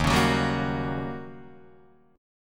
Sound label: D sharp 7th Sharp 9th, Guitar, Open D